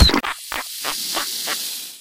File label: bugbait impact; weapons; Garry's Mod; hl2